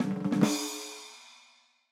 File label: Intruder Alert Show Jingle